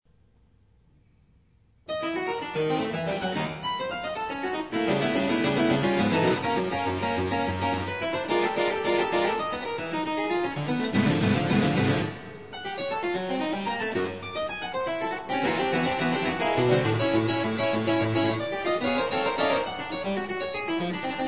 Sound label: toccata harps